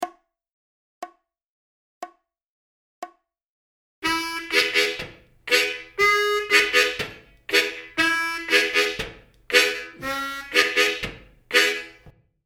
Country Style Vamping Licks Lick 60BPM, harmonica

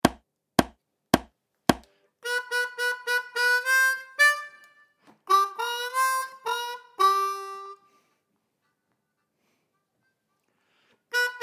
Main Melody, harmonica

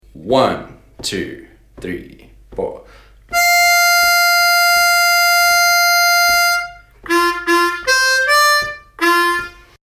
Lick, harmonica

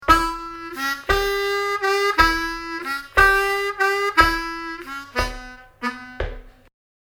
Solo Licks Lick Turnaround, harmonica

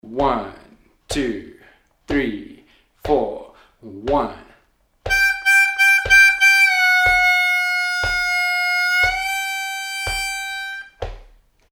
Lick, harmonica

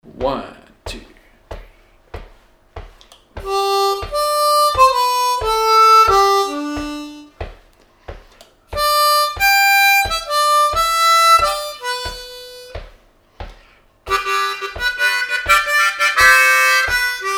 Full Speed, harmonica

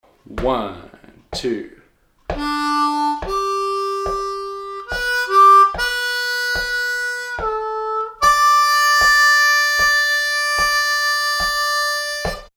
Lower Octave Lick slow, harmonica

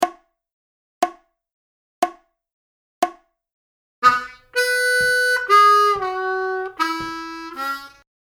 12 Bar Country Style Blues Lick 60BPM, harmonica

